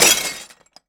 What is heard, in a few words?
Breaking Dish